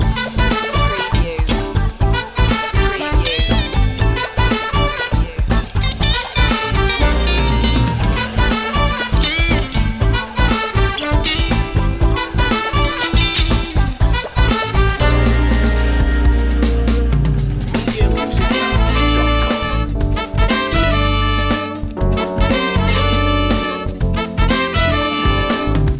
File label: positive vibes loop